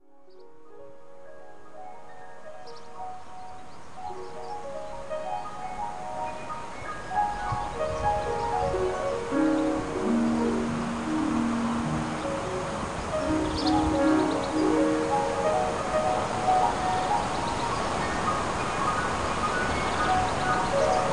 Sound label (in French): piano oiseaux